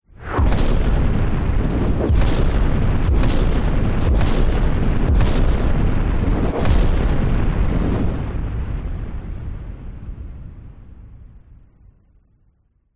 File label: warfare missiles several incoming whizz by then explosions